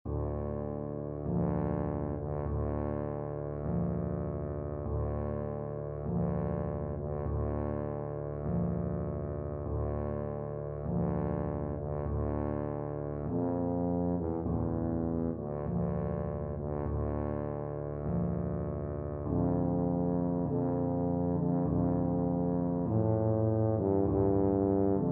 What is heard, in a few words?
chopin funeral march, tuba